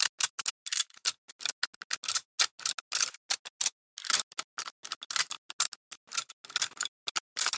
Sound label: bullet put